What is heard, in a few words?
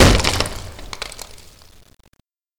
Dummy Crash; Learn to Fly 3